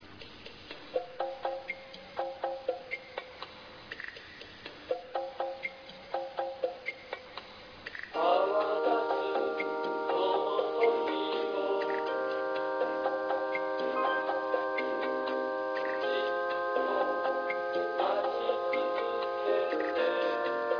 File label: taiko